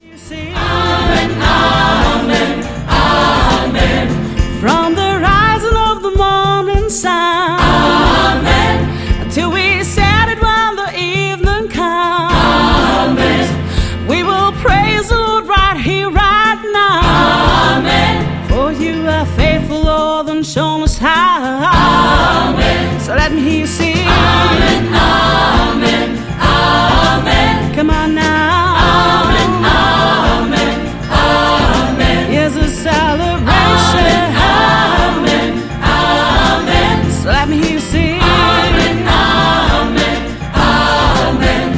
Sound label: Amen